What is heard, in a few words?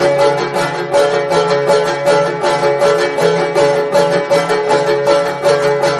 banjo; music